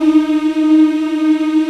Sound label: mmm D#4, Africa; Spectrasonics; Heart of Africa 1